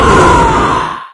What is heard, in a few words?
pain, lostsoul; doom3